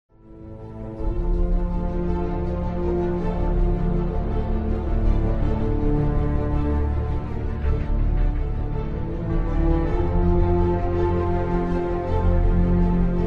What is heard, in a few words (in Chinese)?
专题片背景音乐